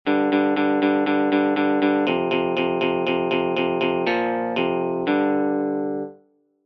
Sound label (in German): Power chords uebung